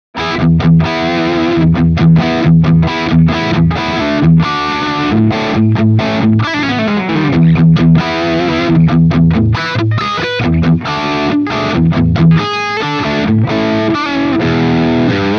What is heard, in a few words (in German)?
Riff Overdrive Steg